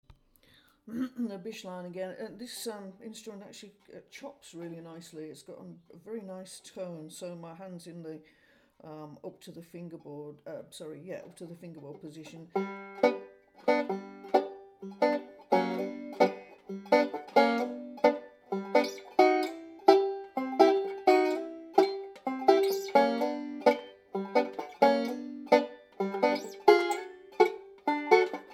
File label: chops, banjo; Bishline